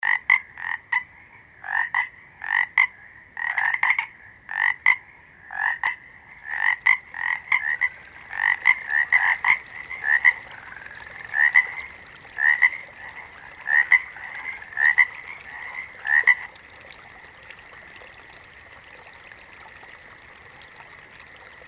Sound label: Animal sounds - Water Frogs